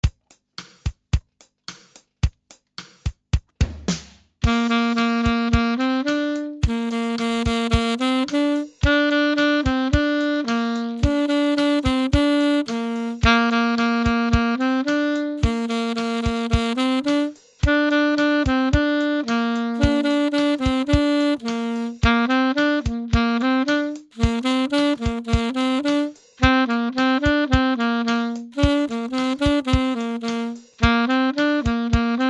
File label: alto